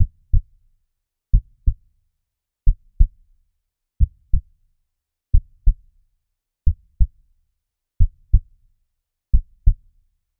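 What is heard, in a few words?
Normal, Heart Sound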